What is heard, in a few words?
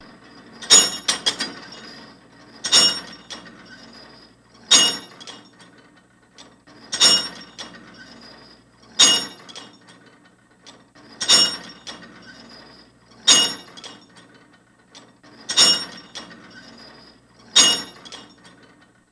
Weight Machine Clin, equipment